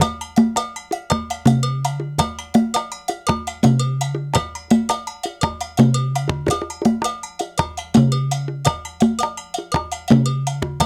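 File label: 110 bpm, 6/8; Spectrasonics; Supreme Beats; African Contemporary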